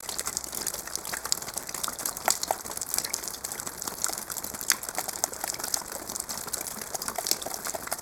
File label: Water Drippage Land; gnarled forest